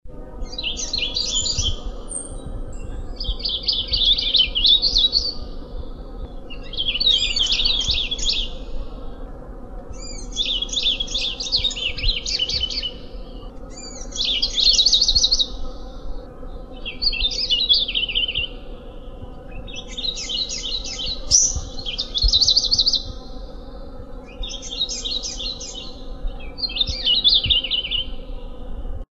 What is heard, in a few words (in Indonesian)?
suara opior sulawesi